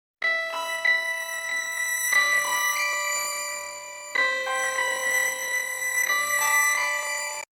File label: sad violin high